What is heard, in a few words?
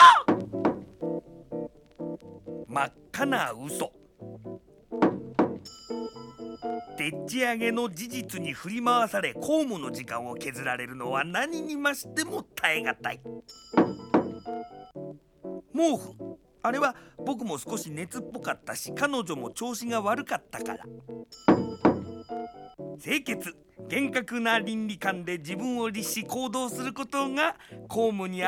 knock